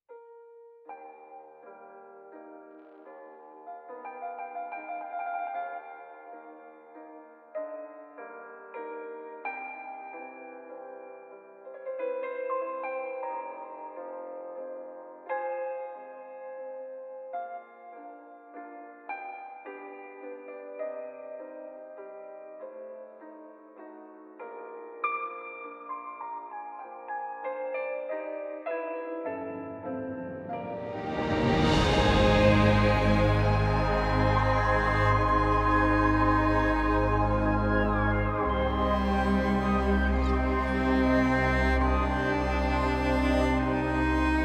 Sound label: 120 BPM; ForestLiftJourney